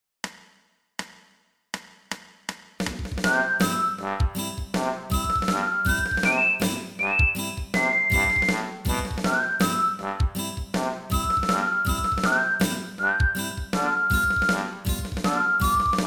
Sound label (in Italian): base con melodia